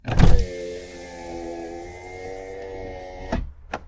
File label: op pwr window, car